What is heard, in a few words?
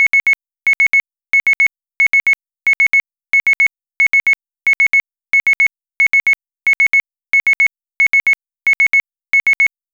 Tone